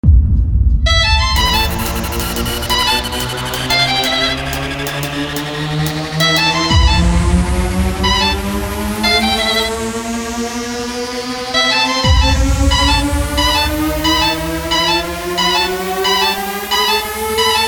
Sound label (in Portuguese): INTRO VIOLINO MALINO